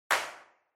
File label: Handclap Synth, Dry